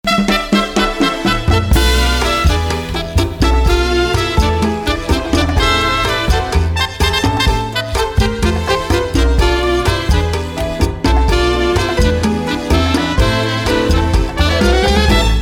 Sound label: Jazz Singer; Los Angeles Jazz Singer; jazz vocalist